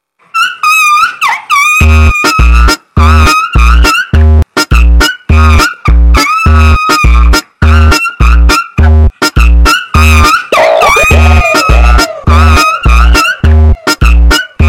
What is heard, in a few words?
Funk ultra Slowed